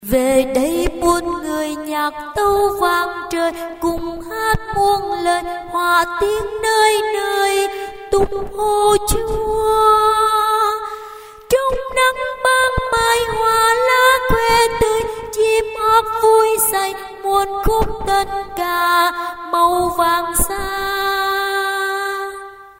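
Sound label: soprano tenor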